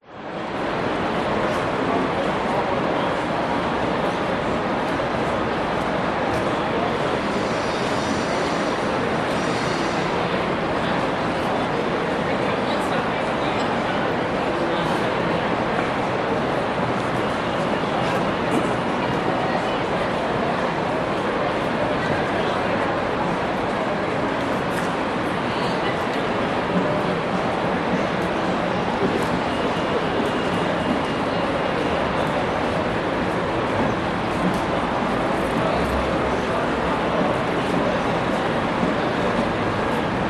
public places city pedestrian zone busy people walk talk city hum; pitt st sydney cbd